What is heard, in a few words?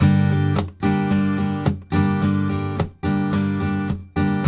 Blues Guitar Samples, RIFF; ILIO; Fretworks